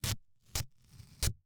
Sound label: Tape Adhesive Tape Pulled Off Roll, Household